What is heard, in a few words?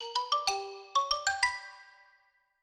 grover says licks; Sesame Street Ready, Set, Grover